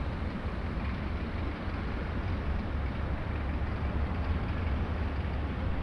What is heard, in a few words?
ambi; Mafie 1